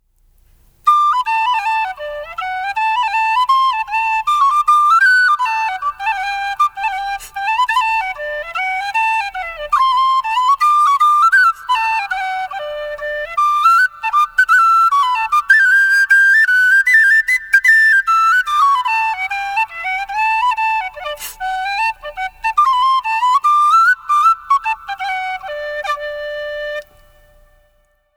Pennywhistle

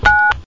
PHONE; office

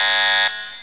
CRASH BUZ

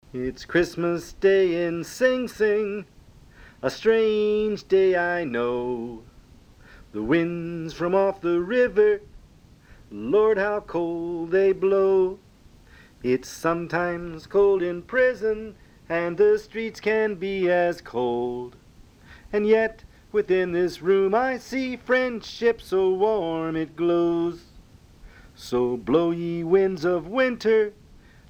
Christmas day Sing Sing

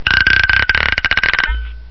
UNCORK

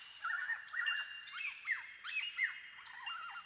Superb Lyrebird Menura novaehollandiae

